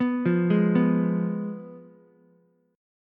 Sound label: Ab Minor 6th Ab down Ukulele; arpeggiated; JGuitar